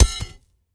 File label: mvt sword drop; smite; gilgamesh